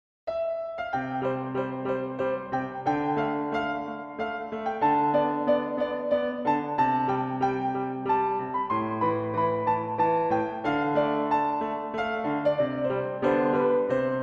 minuetto

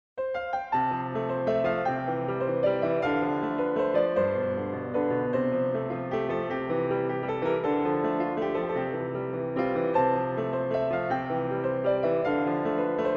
minuetto

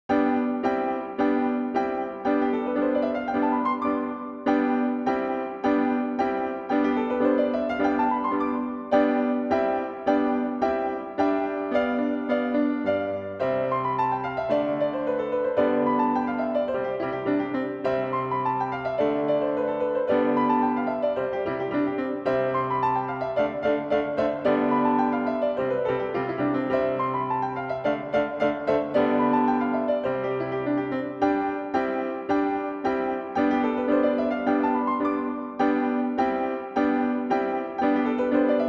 minuetto

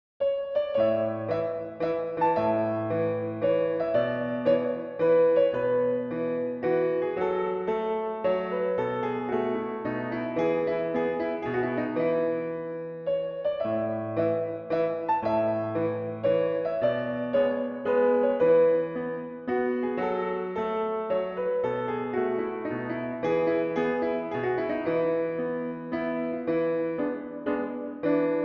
minuetto

